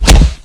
zombie pound door; Source Engine; video game; npc

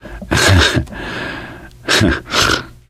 laughter, gambler, survivor voice

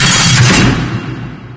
tram hit; Source Engine

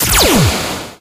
killsound space

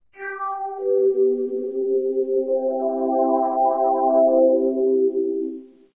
windows 98 exit windows; windows 9x; sound schemes

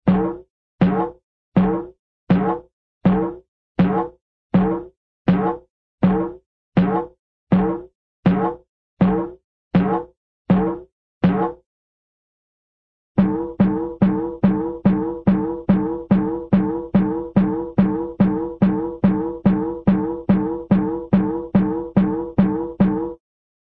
bounce, cartoon timp bounce, slow

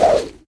projectile swoosh; source engine; tf; passtime; video games

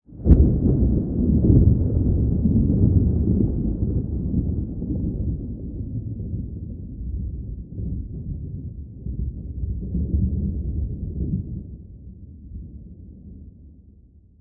thunder; source engine; tf; lair

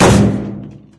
metal barrel impact hard; Source Engine; video game; physics

